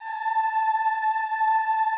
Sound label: A4 L, Classical Choir; Best Service; Extended Classical Choir